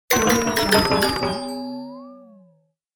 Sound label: chaos bounce cartoon dissapoint